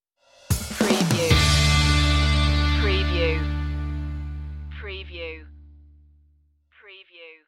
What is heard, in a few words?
rock out stinger